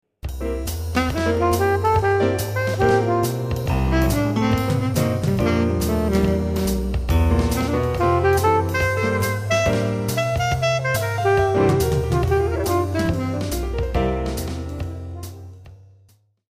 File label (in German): Riff mit neuem Quartett im Multiplayback